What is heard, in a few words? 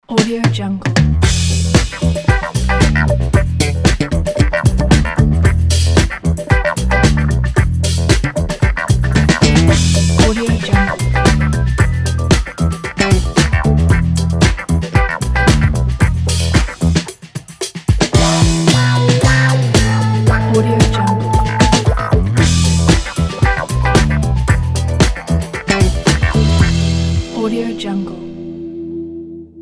70s funk commercial intro